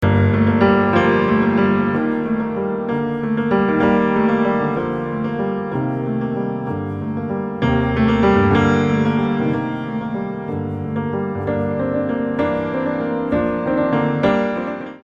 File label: Piano Carol Of The Bells